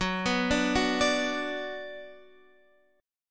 Gb Suspended 4th Sharp 5th Gb, down, Guitar, Half Step Down